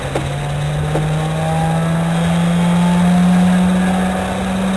merlin starter; Hurricane; flightgear

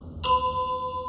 Cling; eboard

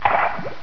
bullet hit water; blobAndConquer; combat

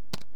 chat message; boswars

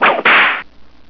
whip; solarwolf

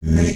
drop; Shaaft-0.5.0

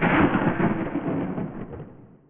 thunder

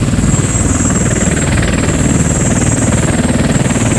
heli

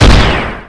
plasma, weapons; ufoai

